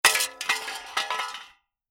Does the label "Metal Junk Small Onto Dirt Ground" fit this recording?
Yes